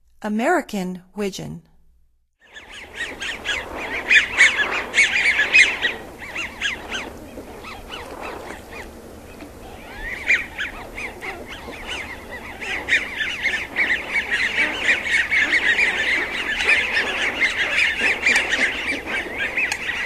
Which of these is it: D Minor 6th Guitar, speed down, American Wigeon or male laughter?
American Wigeon